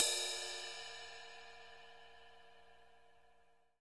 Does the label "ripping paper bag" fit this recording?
No